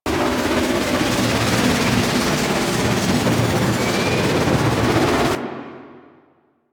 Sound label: Jet Turbine Pistol Secondary Shot Loop; Warframe; Tenno